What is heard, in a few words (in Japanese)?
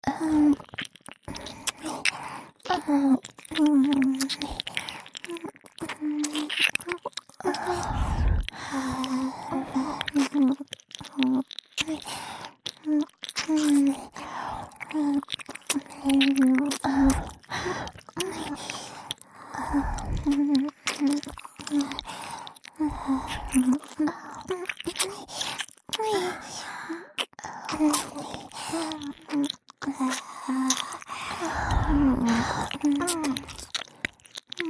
W耳舐め